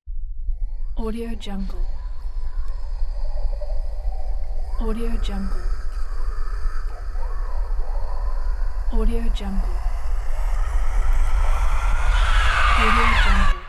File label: whispering ghost riser